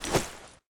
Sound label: throw, bolt